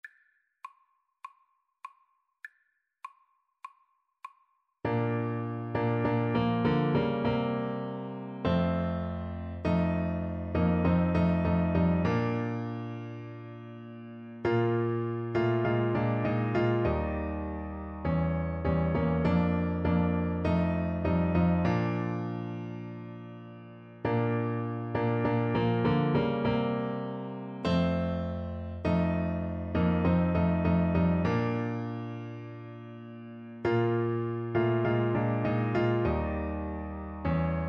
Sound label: just a closer walk, Trombone